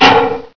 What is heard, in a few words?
metal, debris